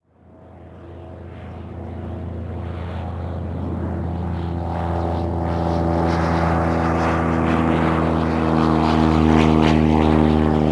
aircraft; ambient; World of Tanks Blitz

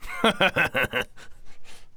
taunt hehe

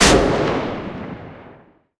mg 8mm l8a1 lastshot; guns; tanks; War Thunder

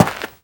foot dirt, footsteps

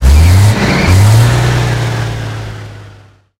tiger diesel engine load, engines tanks; War Thunder

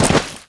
dirt land

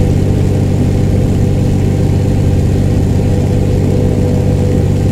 stuka cockpit, engines; War Thunder

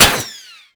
sheetmetal small

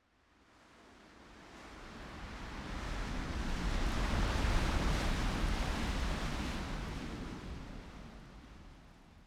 wind buffet; ModernWarfare

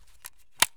aksu stock open; Escape from Tarkov

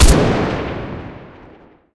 hs mk5 last shot; guns; War Thunder

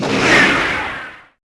Jet Bomb Fire; Civ4 warlords

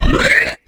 dragon shocked

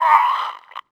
grunt die

